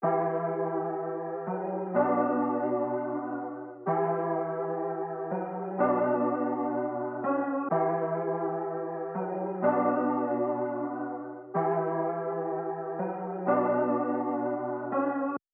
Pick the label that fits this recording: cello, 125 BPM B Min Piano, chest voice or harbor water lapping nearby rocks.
125 BPM B Min Piano